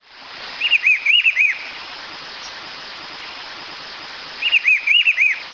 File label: Cyclarhis gujanensis Pitiguari